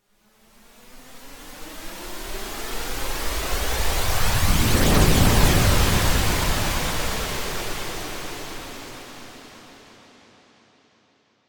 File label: Massive Phase Sweep